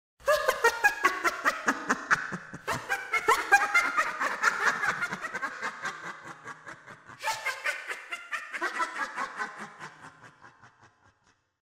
rire

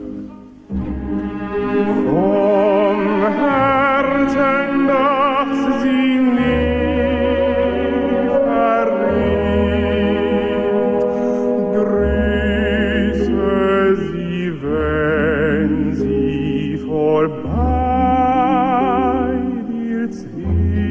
Ciné classique

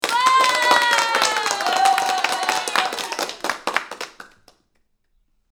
Cheer Cris et applaudissements d ados

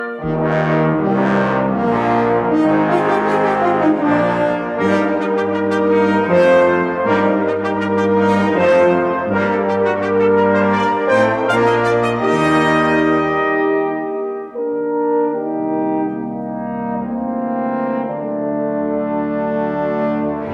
Cuivres

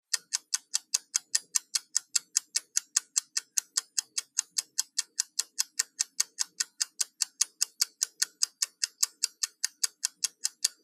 minuteur

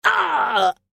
cri douleur homme; sf cri douleur homme

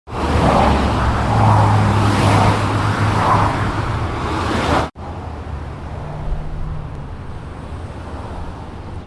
Bruit d autoroute avec sans paroi antibruit

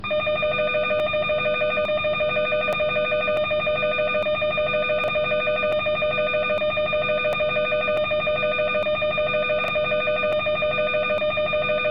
sonnerie bticino

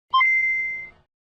vintage gameboy demarrage bruitage

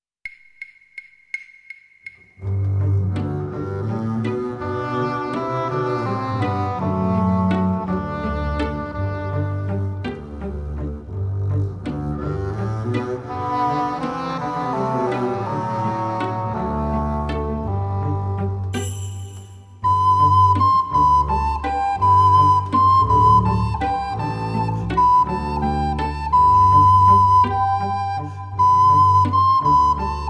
Valse Bleue

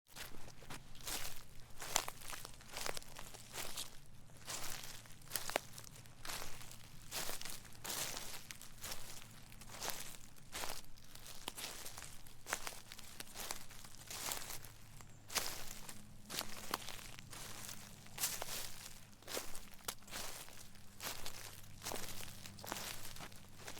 bruit de pas dans les feuilles

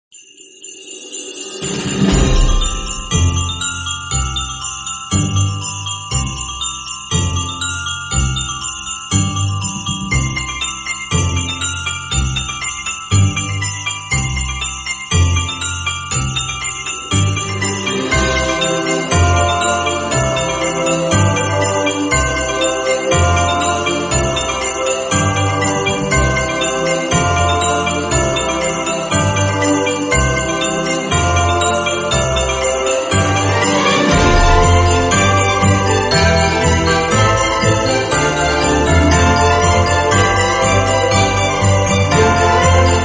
musique de noel a christmas instrumental

